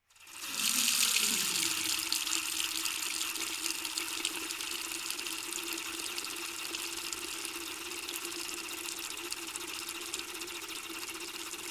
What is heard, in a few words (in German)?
Wasserhahn